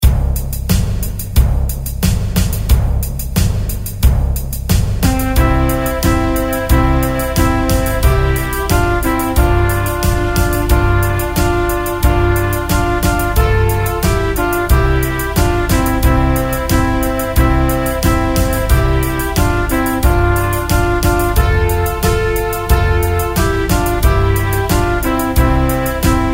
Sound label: Instrumental